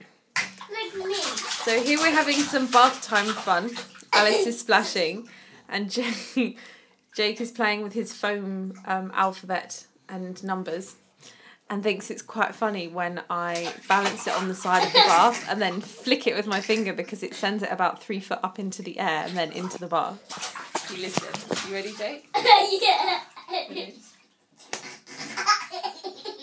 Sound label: bath time laughs, in the bath with lots of laughs